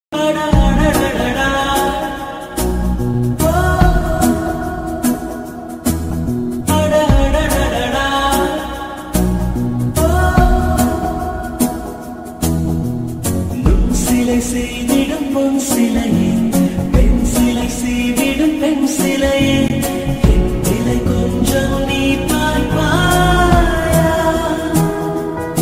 song ringtone malayalam ringtone love ringtone; eecha movie; psycho bgm

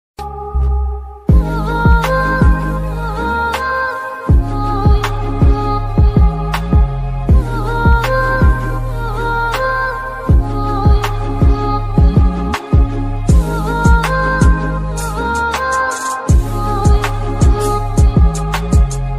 love ringtone music

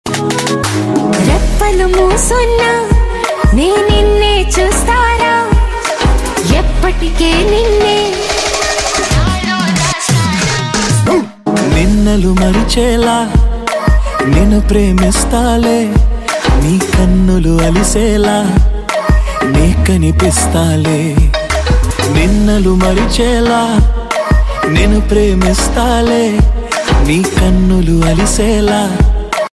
tamil ringtones, tamil song; sahoo

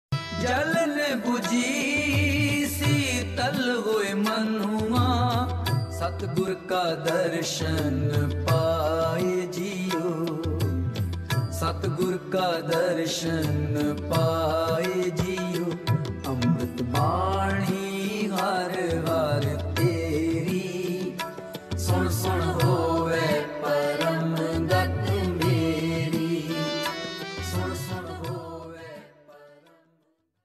gurbani ringtone waheguru ringtone; baba nanak; dharmik ringtone

